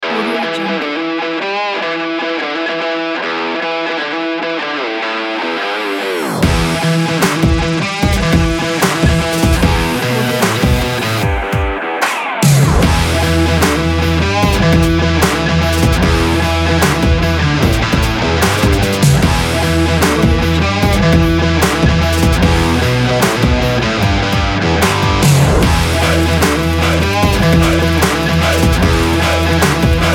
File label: exciting sports rock kit